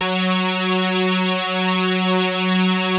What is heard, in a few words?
POLY SYNTH; Wizoo - Powered Wave